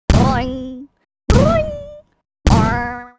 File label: prize idle cannon toy; Ringling Bros. and Barnum & Bailey; Wii